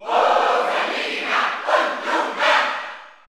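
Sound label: Cheer German, crowd; Rosalina & Luma; SSBU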